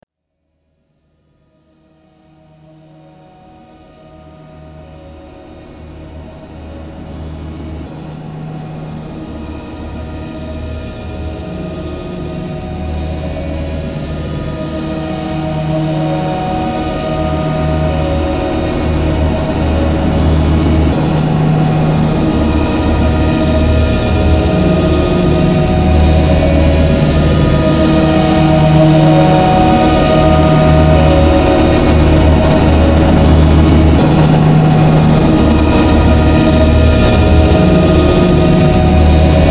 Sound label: Soft Drill Noise